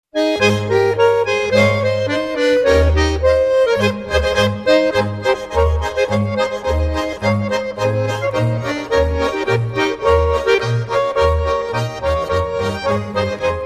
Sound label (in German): 5-Stern-Marsch